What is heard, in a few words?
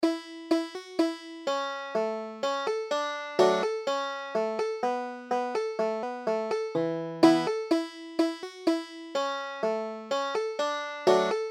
shenandoah falls, banjo